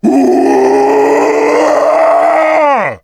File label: groan, zombies